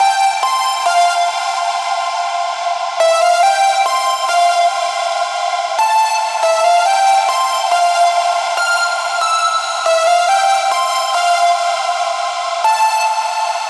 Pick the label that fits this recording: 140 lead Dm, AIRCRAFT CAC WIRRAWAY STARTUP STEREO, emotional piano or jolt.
140 lead Dm